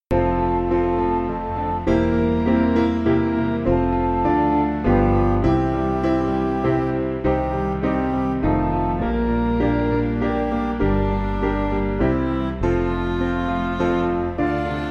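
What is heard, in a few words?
Midi, Music Backing Files for Churches; O Breath Of God; Calm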